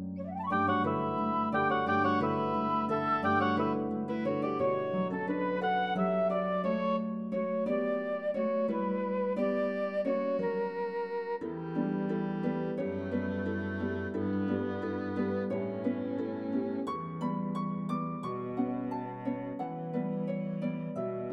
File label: Prelude